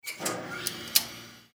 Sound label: elevator old style